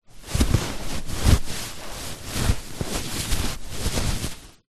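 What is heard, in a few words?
household pillow bamboo movements shuffles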